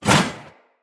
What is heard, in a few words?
Sphere Sword Whoosh; Weapons; Swords; Sphere Sword And Board